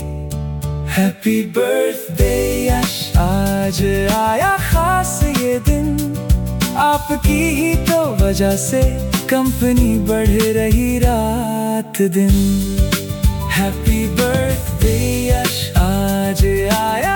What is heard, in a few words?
Employee Birthday Song; corporate